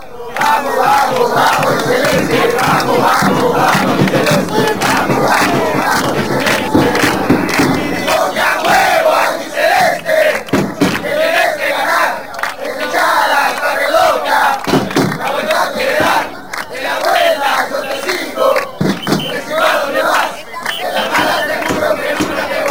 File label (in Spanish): pongan huevos albicelestes, chants